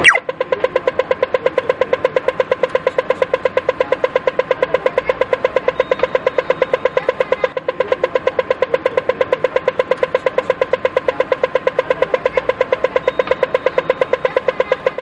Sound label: australian pedestrian crossing cross